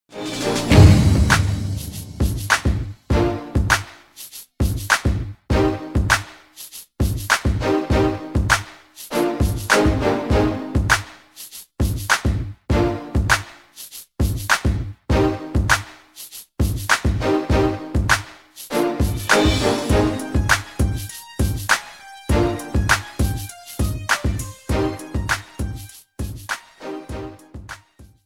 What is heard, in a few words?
hip hop beat